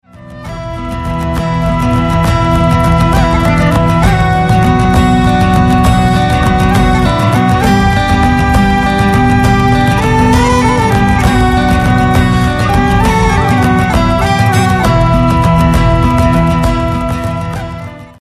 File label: pipe